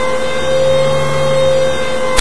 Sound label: tank turret loop, vehicles; Source Engine; video game